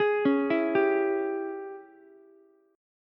Ab Major 7th Suspended 4th Sharp 5th Ab down Ukulele; arpeggiated; JGuitar